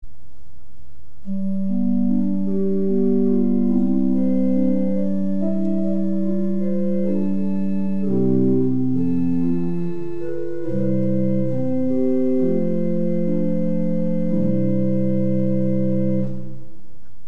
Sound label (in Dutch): orgel, Holpijp 8', Prestant 8'